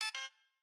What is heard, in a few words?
Turret Fail